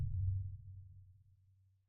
sonar Tail Air Far Beacon Directional; Barotrauma; Real Sonar

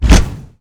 heavy footstep; Footsteps; Barotraumatic

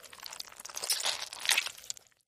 worm; Barotrauma; Barotraumatic; Seasnek

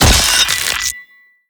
attack claw hit; Thalassophobia Restored; Humanapostle

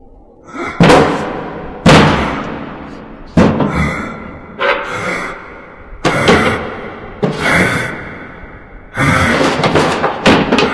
walking; Barotraumatic; Deepseaclownnew

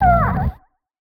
cry; Barotrauma; Barotraumatic; Yellowboy

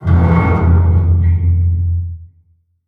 Structure Crunch; Damage; Barotrauma; Room FX

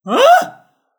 grunt; Barotraumatic; Clowns; Clowndeathmask

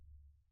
sonar Tail Air Medium Beacon; Barotrauma; Real Sonar

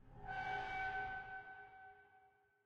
Ambient; Barotrauma; Subsurface

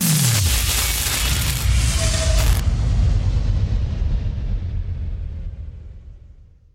derelict reactor die; Barotraumatic; Carrier Leviathan

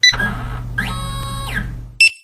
selfscan; Barotrauma; Neurotrauma

